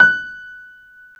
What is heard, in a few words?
Piano Grand; CMI